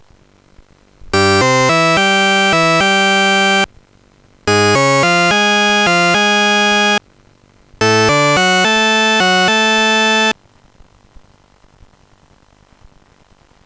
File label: tune; Sonix Music Driver